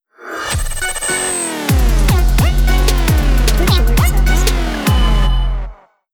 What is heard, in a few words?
Trap Raid Sound